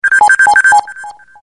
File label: Notifier Beacon; V710 Ringer